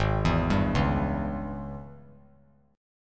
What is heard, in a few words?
Ab Major 7th Suspended 4th Sharp 5th Ab down; arpeggiated; JGuitar